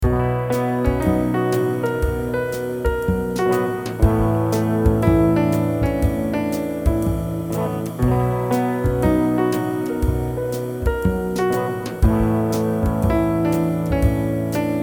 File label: jazzy